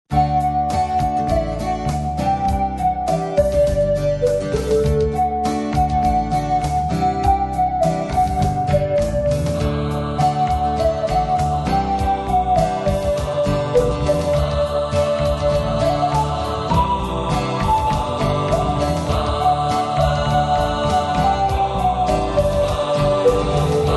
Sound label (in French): Musique corps